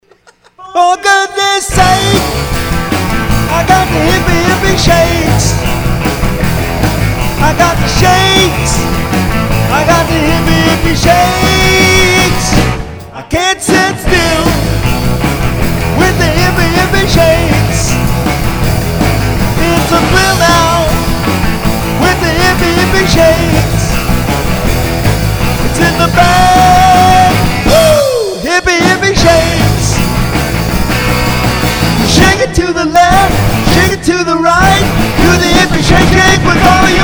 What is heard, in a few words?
New Lead Vocal